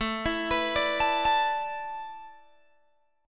A Suspended 2nd Suspended 4th A Guitar Open G, chord